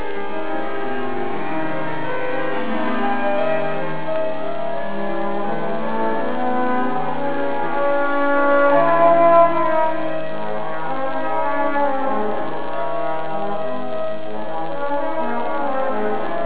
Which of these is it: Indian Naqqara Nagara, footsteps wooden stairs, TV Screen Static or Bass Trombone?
Bass Trombone